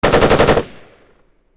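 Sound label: m 16 exterior shots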